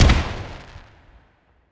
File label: Result Fireworks; Pac-Man Championship Edition 2 Plus